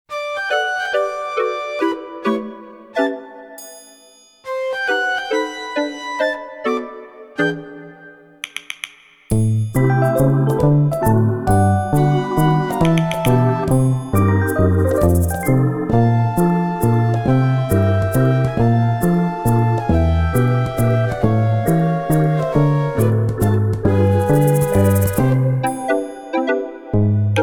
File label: tiny waltz